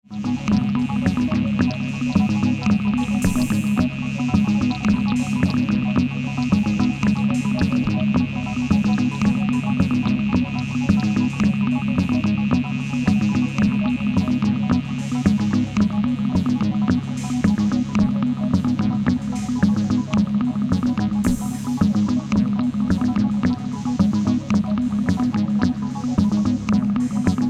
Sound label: Melody Attack